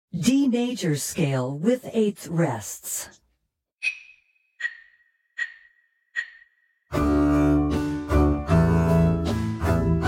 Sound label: D Maj Scale Eighth Rest